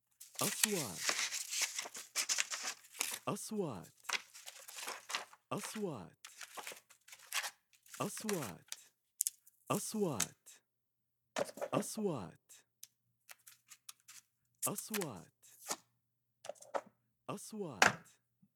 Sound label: dairy papers browsing